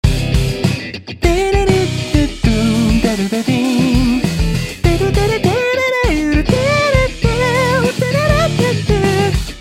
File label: mute piano